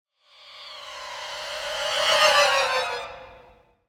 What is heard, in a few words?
Symphonic Hit Going Down Violins; Hollywood Edge; sound designer's toolkit v2